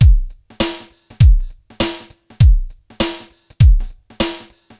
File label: drloop, drumloops, Vinyl Attack; Masterbits